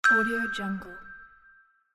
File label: new sms text message